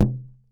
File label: Balloon Bounce; SteamVR; InteractionSystem